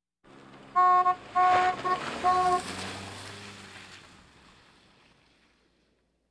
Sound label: Automobiles various